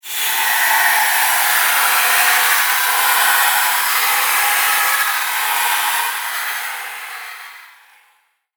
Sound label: Demon Souls Nightmare Attack; Scary Soundscape Sound Effects; Horror